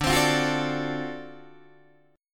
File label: D 7th Sharp 9th D down Guitar Open D